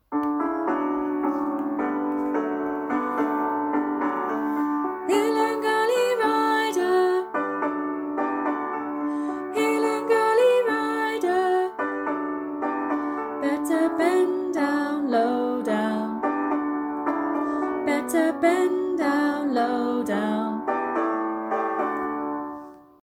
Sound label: hill n gully song with piano call; SONG WITH ACCOMPANIMENT; In Harmony teacher resources; EYFS and Key Stage 1; song - call wih accompaniment